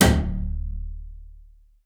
BING D1, BASS FINGER; Xsample VOL-5

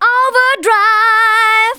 VOCALS SUNG; Techno Trance Essentials

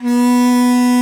BON DI, Winds, Old World Instruments

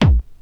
hard bass drumz

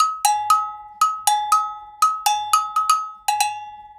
120 AGOG, Percussion Loops, AGOGOS

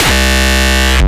Speedcore Kick

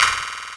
fx snare; Trance; Drums; Snares; Trance Explosion Vol1

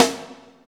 SNR BIG, Snares; AMG; Now CD-ROM (Roland); NOW! Drums

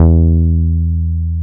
BAS MKS-50; Sound & Vision - Gigapack II CD 1

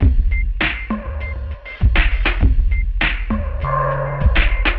drloop, drumloops, Vinyl Attack; Masterbits

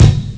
bass drum; ST-XX Amiga Soundtracker Sample Packs

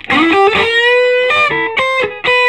SOLO G, Vintage Blues Guitar; AKAI; USB Soundscan vol.22

